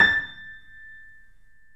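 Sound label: A6, Bösendorfer, 3D Pianos; E-MU Producer Series Vol. 5; 3-D Audio Collection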